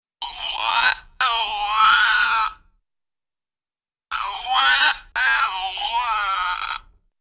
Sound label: Medium Short Duck Quack-like Creaks In Pairs; Cartoon; Hollywood Edge; Cartoon Trax